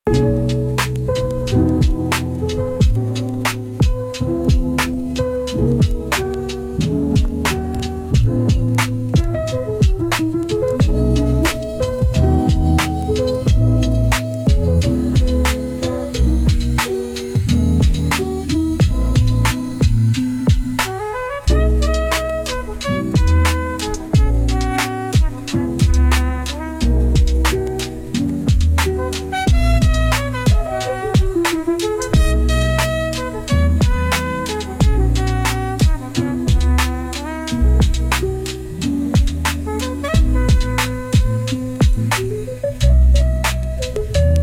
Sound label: Glitch March, lofi